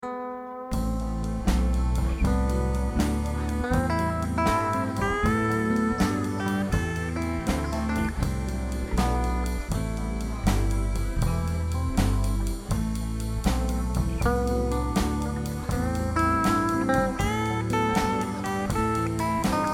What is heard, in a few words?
Space Blues Jam; ClassroomGrooves